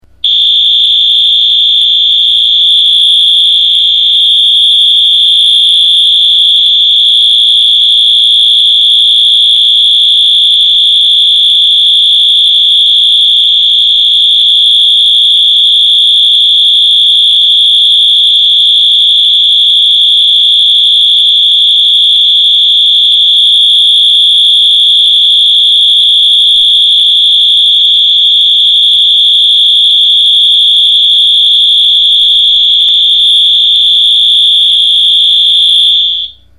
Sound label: wheelock as 24 15, alarm